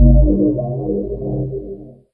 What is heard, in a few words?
menu prev; pod skyrace